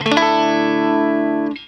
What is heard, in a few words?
Guitar Lick; Zero-G Jungle Warfare 1, 2 & 3; Guitars